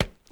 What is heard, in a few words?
boots cement; Apotheorasis